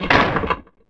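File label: CLOSET HIT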